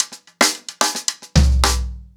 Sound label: Crash 110BPM, ACOUSTIC DRUMS